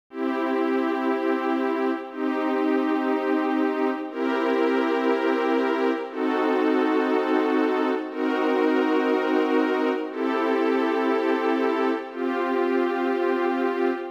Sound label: chord C str